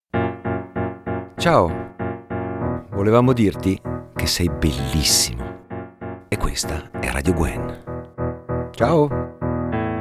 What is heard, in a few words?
Jingle